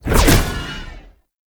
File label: Teleport Start; Enemies; Abilities; Warframe; Tau; Dax Ninja